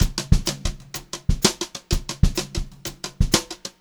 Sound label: Brushed Drum Loops; USB Soundscan vol.39; AKAI